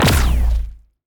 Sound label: laser; spacemap; npcLasers